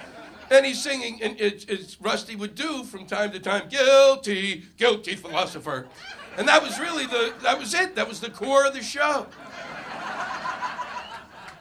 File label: Sings